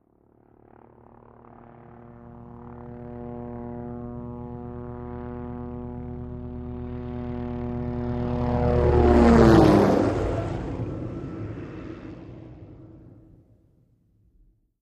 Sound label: OH6 Loach Dive In By, little bird